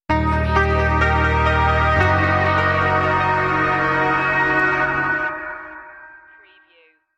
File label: Scifi Audio Logo Ident, Melodic, Reverb, Positive